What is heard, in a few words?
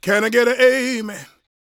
gospel male, vocal; ILIO; Vocal Planet